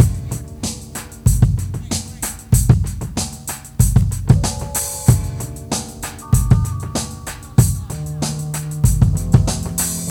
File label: Rhodes Rhythm 95 bpm, breaks; Zero-G Beneath the Planet of the Breaks